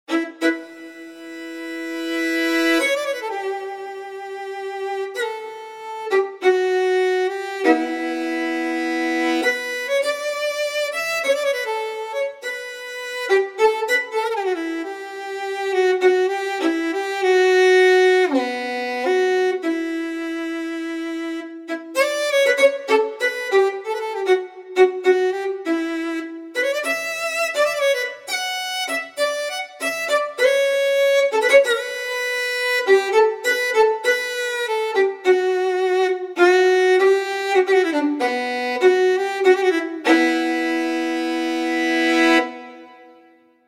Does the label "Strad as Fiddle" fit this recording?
Yes